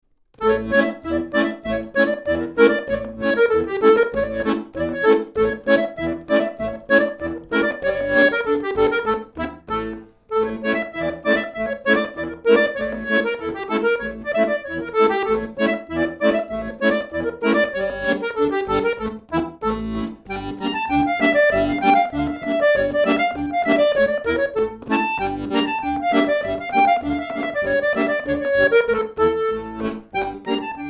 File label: Klezmer, Jewish Dance